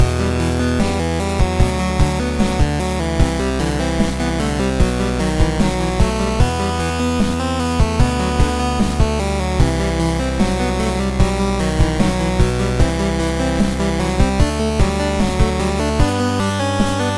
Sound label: Valley Loop